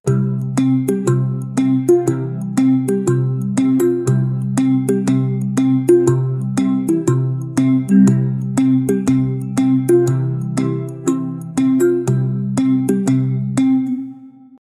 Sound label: light music for cosmetics, advertising